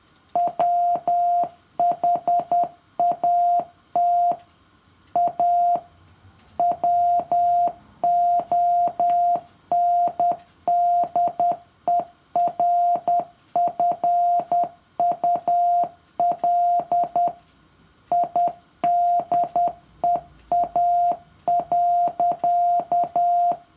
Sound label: morse code; for year 3